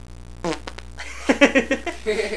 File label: spitter